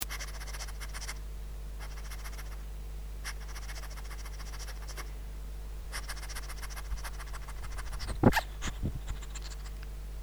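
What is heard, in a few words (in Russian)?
fyrchanie ezhika, hedgehog snorting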